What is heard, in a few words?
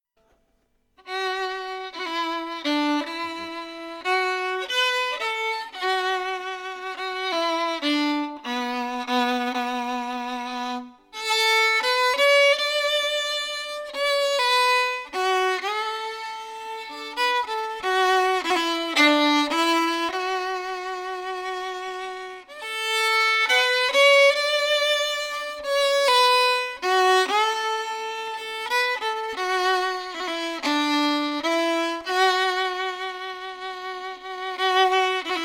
skibereen fiddle